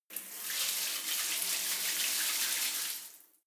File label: shower short sequences; haus garten; badezimmer